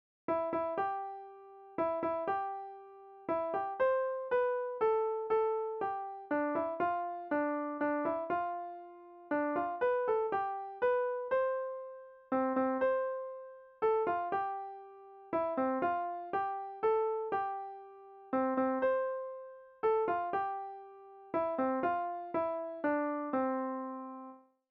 brahms lullaby

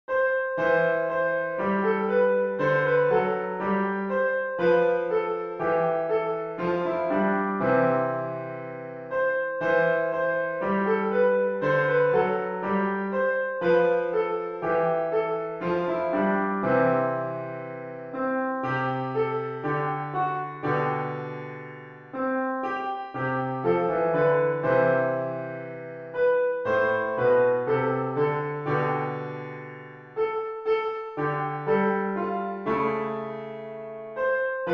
a mighty fortress piano

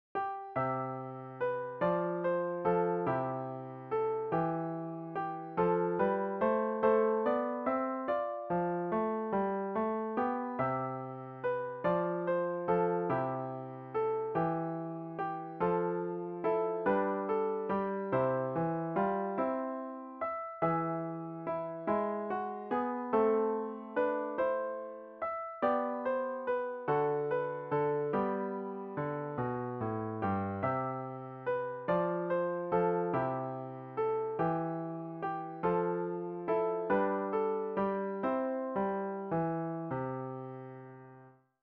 piano

